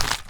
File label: paper close